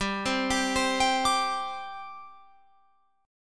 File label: G Suspended 4th G down Guitar Open F; arpeggiated